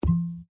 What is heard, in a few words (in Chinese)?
软木塞